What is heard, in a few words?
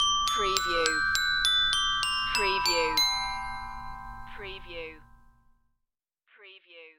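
Xylophone Melody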